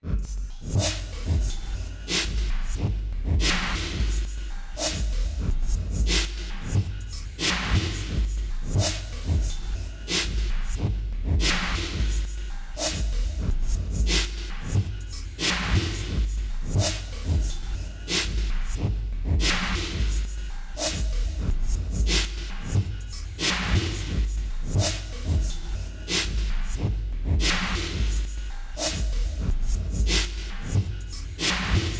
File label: Erica Synths Black Hole DSP2 Drums reverse time modulation